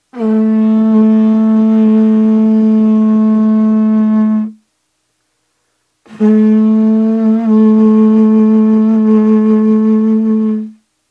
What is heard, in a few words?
didgerido